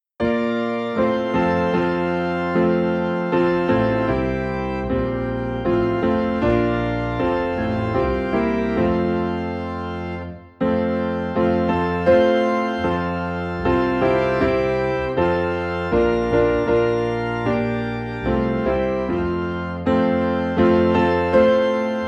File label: Piano and Organ - High in F; The Solid Rock